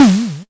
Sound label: Arturia MiniBrute prc